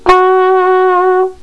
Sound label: FLUGEL13